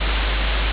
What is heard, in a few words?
ambience room tone